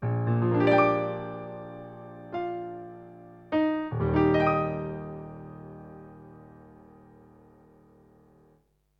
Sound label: chord, Bb7 13 chord